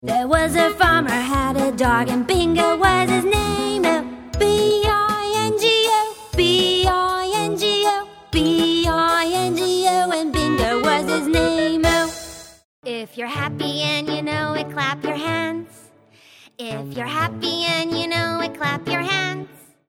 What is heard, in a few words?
Songs; USA